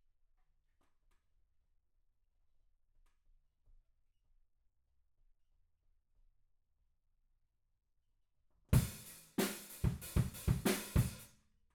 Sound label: Room